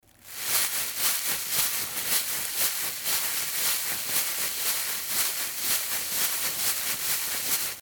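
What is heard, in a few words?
sound of rustling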